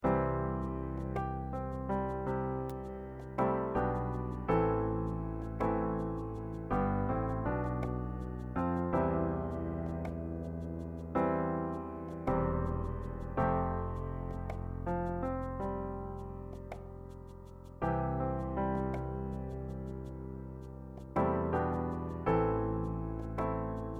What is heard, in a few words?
Accompaniment